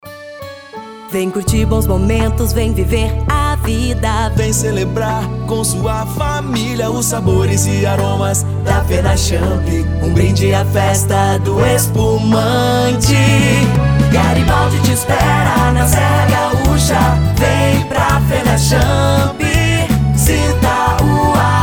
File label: jingle; Fenachamp; Assinatura